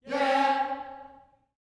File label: "yeah" C#4, Gospel; Spectrasonics; Vocal Planet Vol.1 Gospel; akai